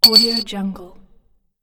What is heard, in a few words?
bottle cap